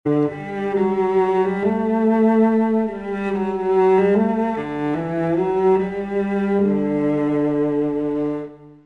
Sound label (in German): cello